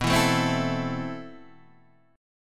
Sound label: A Minor B, guitar; Am/B